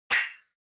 Retro Anime Switch Low

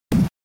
Gen Fighting Defend

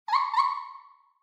Horror Laugh Reverb

